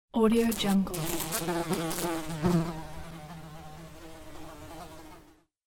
big fly buzz

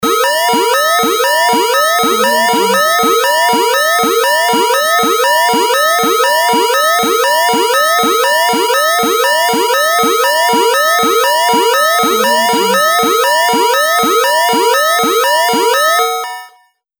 emergency warning alarm; industrial sounds

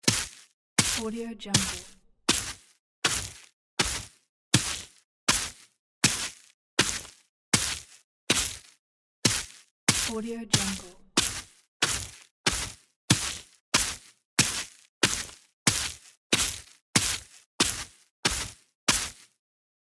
footsteps grass

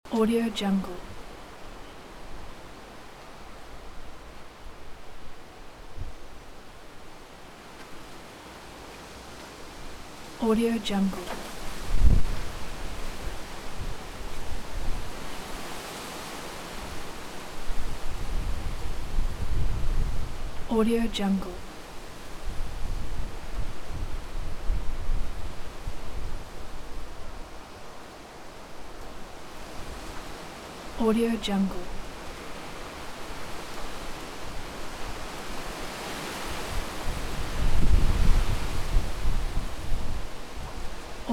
wind in orchard loop; وزش باد در میان باغ; nature sounds

